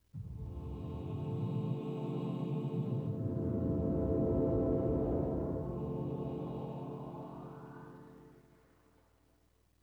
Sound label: PAD, Hip Hop and R&B; Best Service; ProSamples vol.01; AKAI